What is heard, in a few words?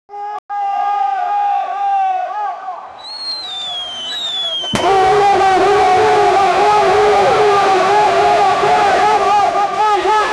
heavy exchange of gunfire